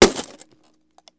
glass; Mafie 1

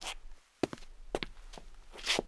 krok soup; Mafie 1

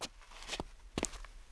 preslap; Mafie 1

